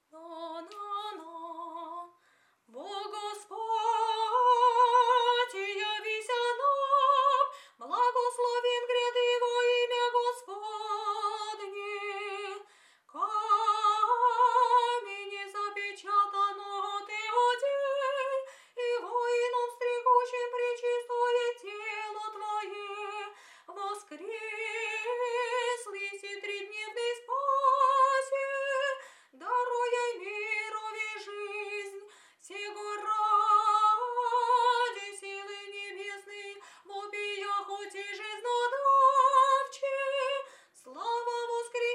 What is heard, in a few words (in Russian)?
Tropar 1 glas 1 golos